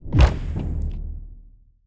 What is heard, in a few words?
ui fast battle win low layer